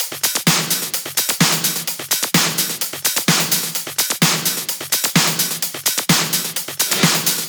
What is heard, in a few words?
Houseloops 128BPM; Vengeance Samples